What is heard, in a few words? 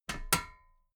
metal ladder step regular clank